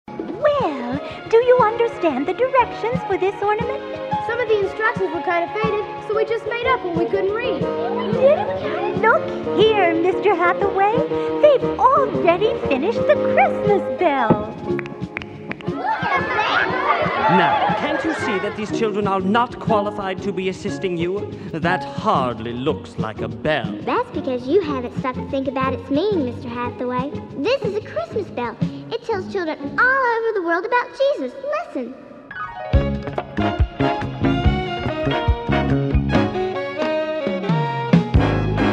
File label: Christmas Bell